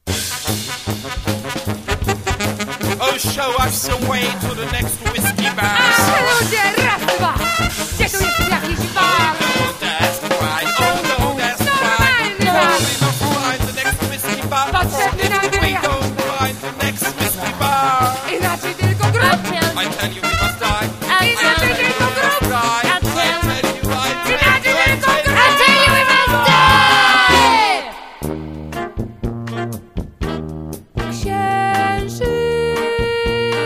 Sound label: brass